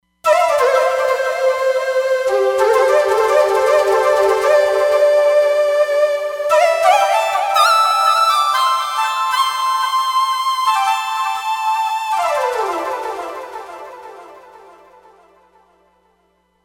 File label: Power of Pan, Roland Fantom XR